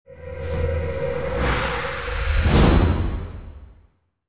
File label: Boss Skill Storm Fall Start